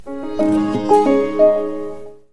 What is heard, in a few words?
Samsung TV Chimes